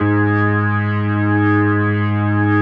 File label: G#2S, B3, Vintage Keyboards